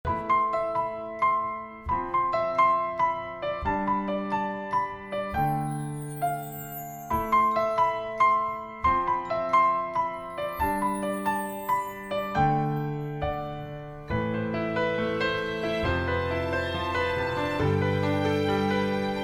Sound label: Instrumental